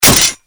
Bear Trap; Granny 3; Android

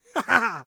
Voice Male Haha; Smashy Road Wanted 2 android ios switch gamerip 2020

